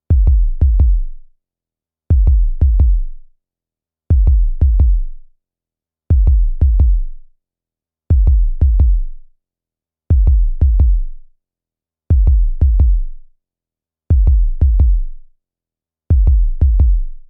Pounding steady heartbeat loop; Heartbeats; Human

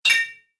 Message Alert, beep

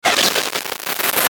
static bright; Static Bright Crackle Burst

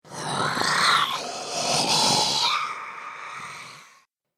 Zombie - Mr Slurpy; Zomby Sound Effects; horror sound effects

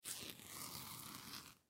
Banana Peel Skin Fast Fruit; Foley Banana Peel Skin Fast Food Fruit; Blastwave

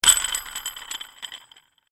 Handle Screw Turn, Handle, Screw, Turn, Metal; Loose; Hand Tools

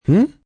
"Hmm" Short, male; Reaction; Exclamation; VoiceBox

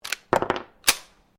PISTOL TANFOGLIO 38 SUPER LIVE ROUND EJECT; Handguns, non military

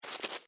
Insect Dig Sand, Insect, Dig, Sand, Bug, Movement; Animals:Insects; Miscellaneous Insect; Blastwave

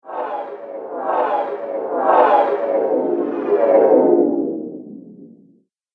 Odd Alien Landing Sound; Alien Sound Effects; Science Fiction Sound Effect

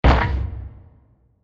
Short Impact Blast; Blasts (Sound Effects:Impacts)

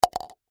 Golf Ball Hole, Sports, Golf, Ball, Into, Hole

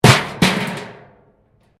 metal rubbish bin smash concrete; Metal (Sound Effects:Materials

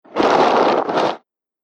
Horror Movement Crumbling Crypt Sounds; Scary Soundscape; Andrew Fleming

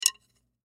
Drum Sticks, Drum Sticks Rub Together Click; Drum Kits; Blastwave

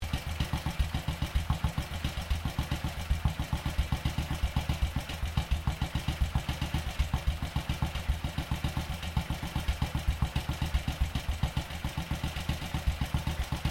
MOTORBIKE BSA GOLDSTAR IDLE LOOP; Motorcycles; Vehicles

